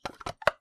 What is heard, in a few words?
fusecap open, subway trains; MetrostroiAddon